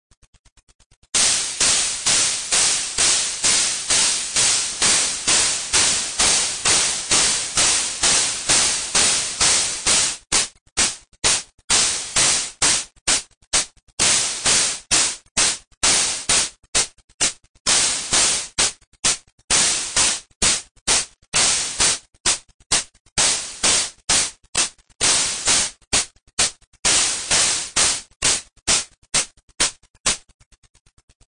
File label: cymbal